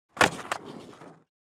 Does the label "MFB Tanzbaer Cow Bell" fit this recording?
No